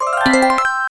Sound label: right answer effect